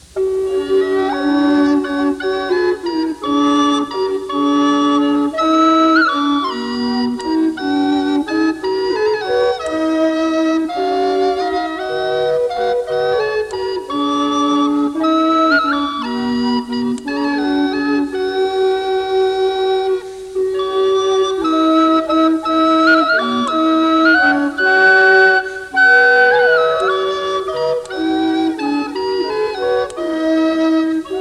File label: Garden Hymn